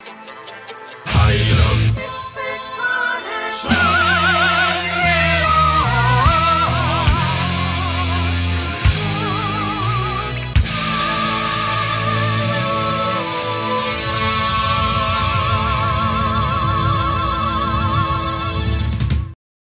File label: Metal Opera